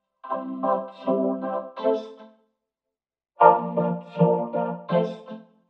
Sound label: Vocoder VP-330